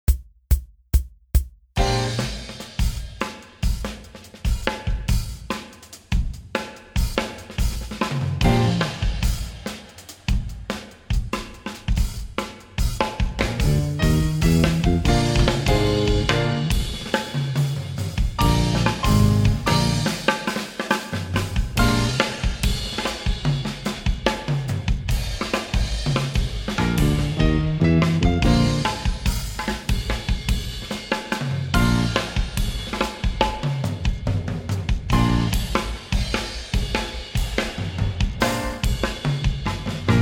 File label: drums i chord rock; transcribing solos